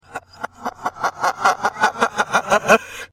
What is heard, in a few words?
Laugh; Lodge